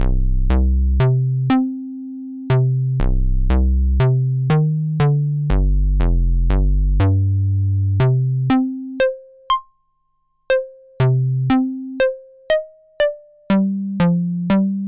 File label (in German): Model D Rechteck mit Filter Env